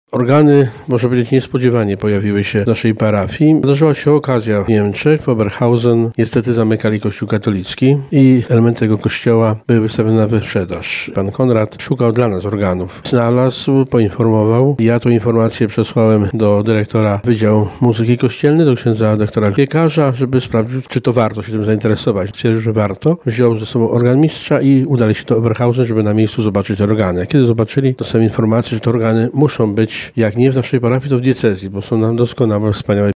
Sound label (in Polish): organy